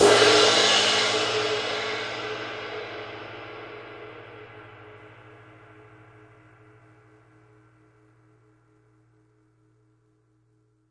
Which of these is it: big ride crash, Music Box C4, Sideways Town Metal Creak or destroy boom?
big ride crash